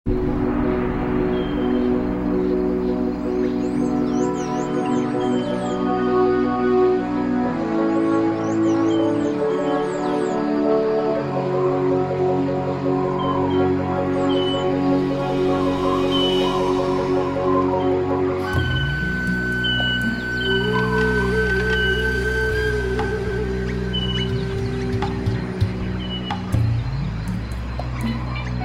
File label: Boulder Machinery